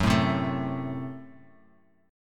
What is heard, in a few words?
F sharp Diminished Bass Standard; F#dim strummed